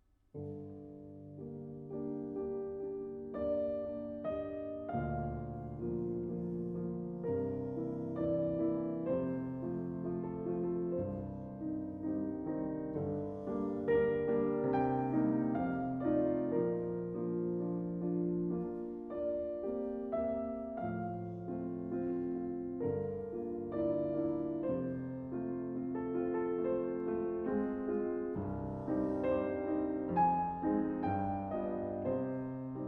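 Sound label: piano sonata g minor; stenhammar; sats 2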